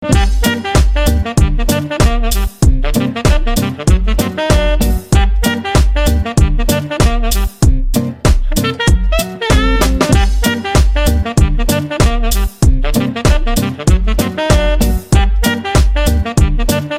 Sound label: Latino Beat